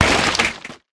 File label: mined tree; mining; yumako; Factorio